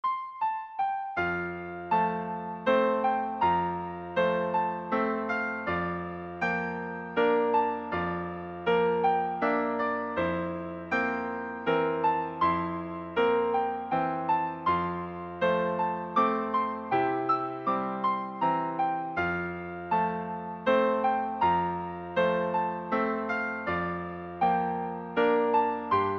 piano duet; tchaikovsky concerto

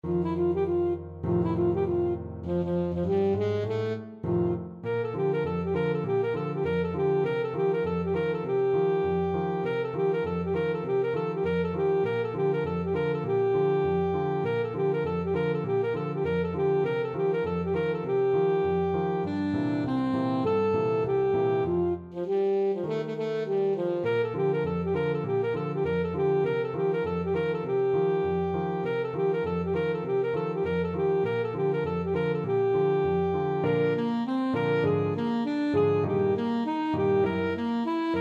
saxophone

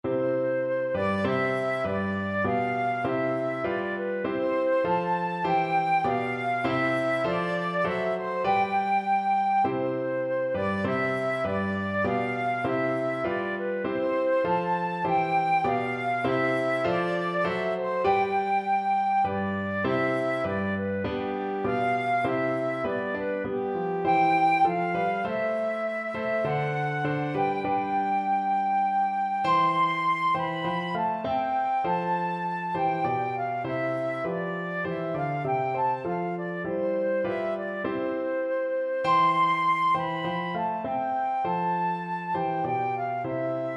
flute

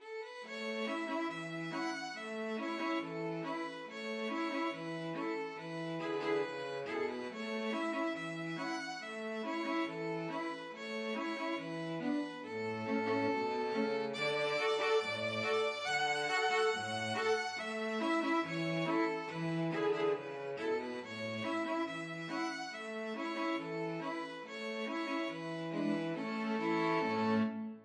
string quartet

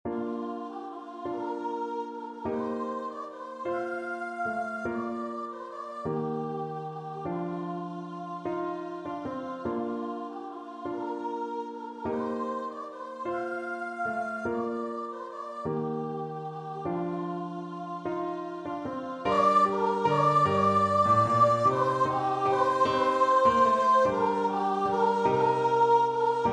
skye boat voice, unison choir

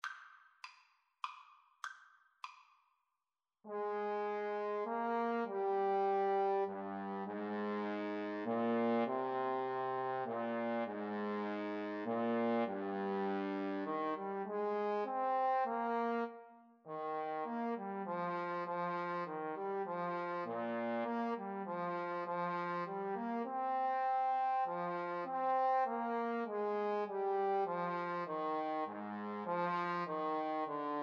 jupiter, Trombone Duet

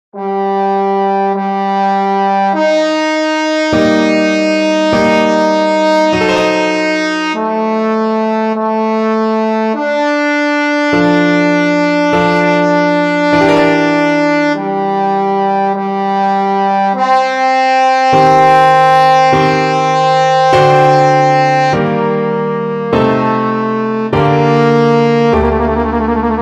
sonata, trombone

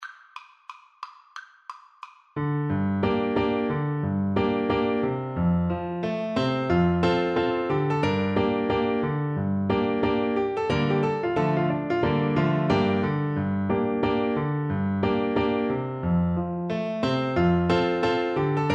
swallowtail, Recorder

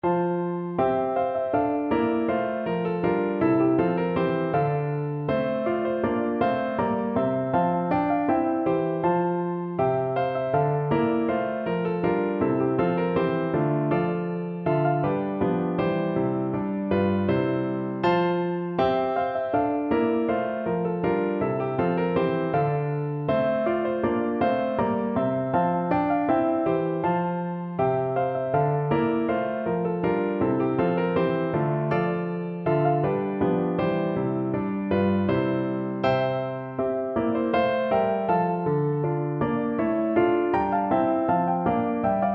Hornpipe PNO, piano

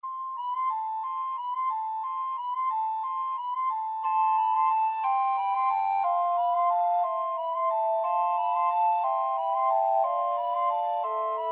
carol of the bells, recorder quartet

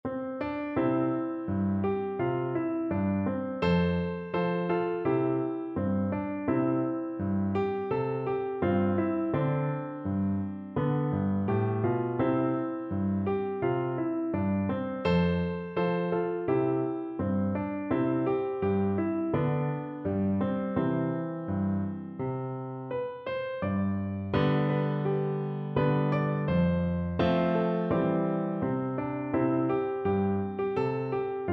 leaving liverpool, piano

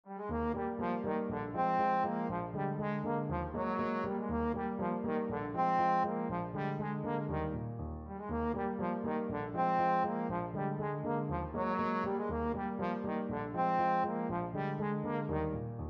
ecossaise, trombone

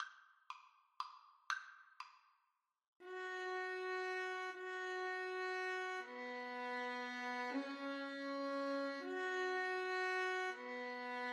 violin duet

